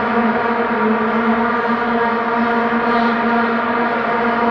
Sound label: vuvuzela; superstriker